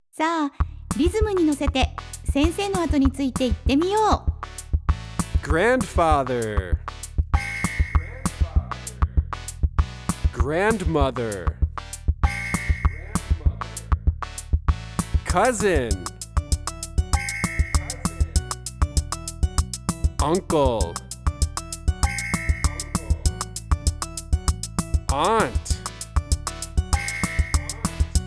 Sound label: samba; Kindergarten Practice